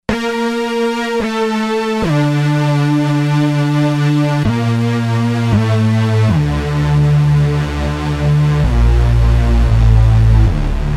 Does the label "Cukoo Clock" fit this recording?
No